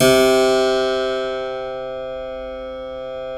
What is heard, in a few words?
C 2 CLAV; Keyboards Acoustic; AKAI; USB Soundscan vol.17